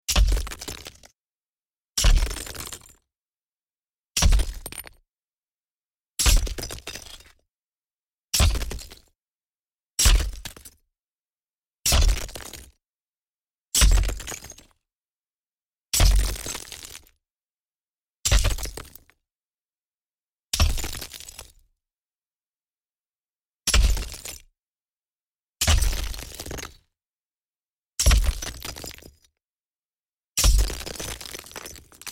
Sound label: Rubble Breaking